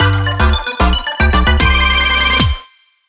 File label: SFX Rareget